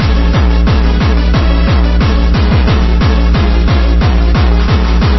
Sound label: ukraine hymn hardcore remix